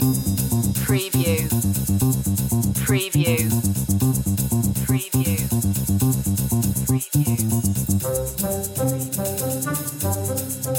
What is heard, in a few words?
jumping jacks loop